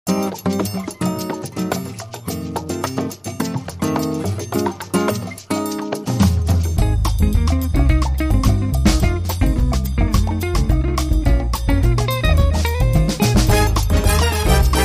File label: Latin Lounger